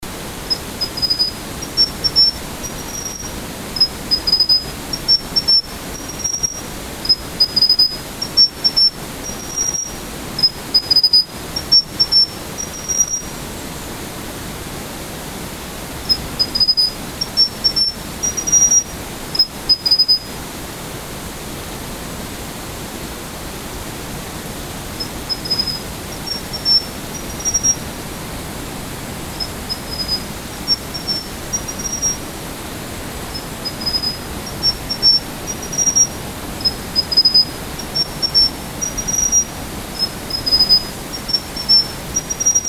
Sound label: elachura spotted Elachura formosa